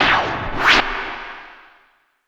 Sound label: Turntables